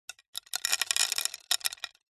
foley stones tiny gravel small amount drop sprinkle on wood; zapsplat